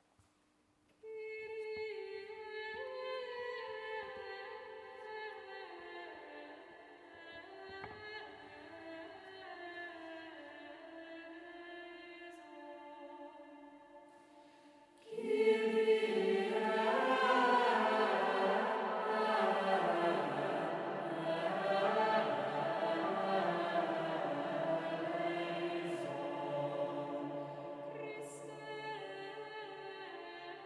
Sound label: Kyrie with reverb